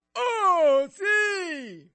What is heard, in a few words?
Correct Answer; spongebob; nickmaster